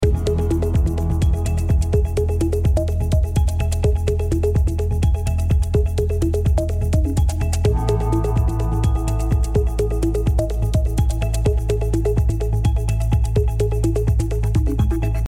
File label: Hi Tech Loop